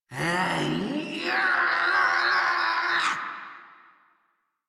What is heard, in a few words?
Scream; Warframe; Grineer Catbrow Handler